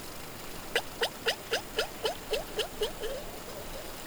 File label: P macrocephalus trumpet